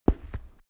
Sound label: step stone walk; schritte